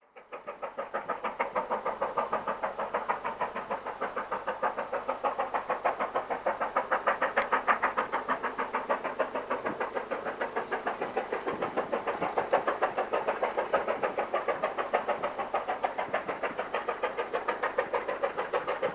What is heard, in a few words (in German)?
dampflok sound china